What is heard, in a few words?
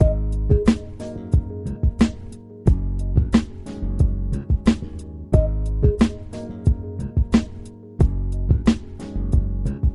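loading game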